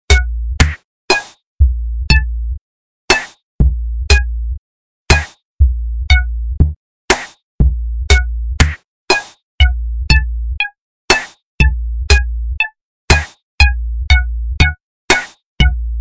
funky piano high amp, reggae; ringtones

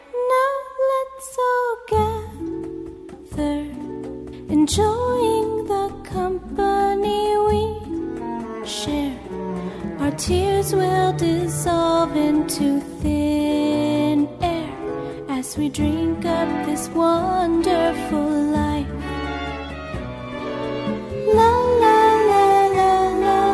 small prayer

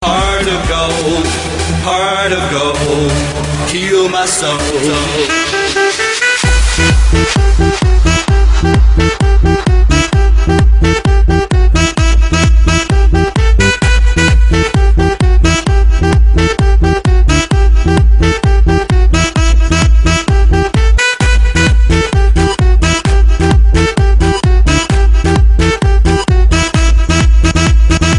sax house, ringtones; alternative

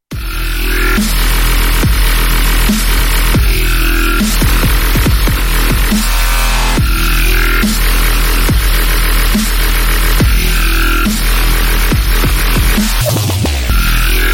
Dubstep Music

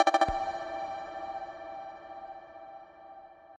IPHONE 7 BLIP

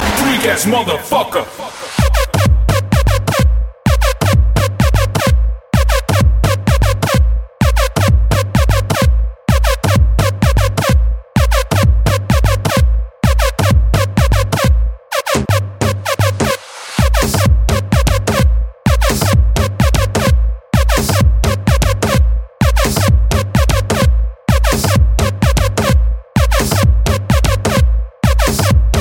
Best dance song

